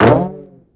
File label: Plunk; EarWax; System Alert